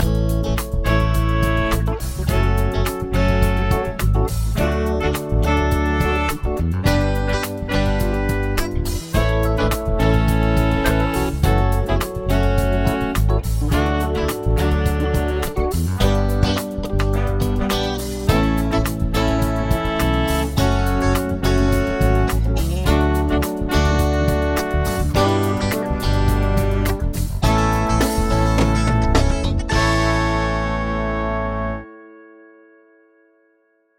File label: chord prog with mixture